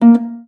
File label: STOP A3, harp; Xsample VOL-8; HARP+FX